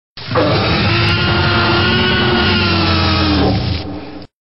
T Rex

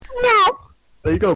Meow

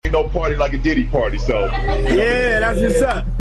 party sound effect

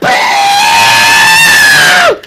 Birdscream

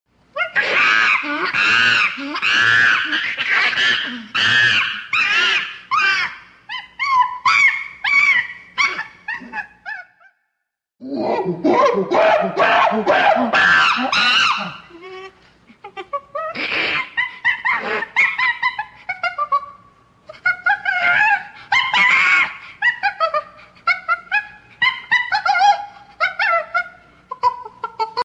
Monkey scream chimpanzee sound

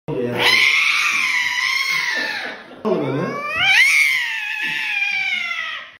Screaming Cat

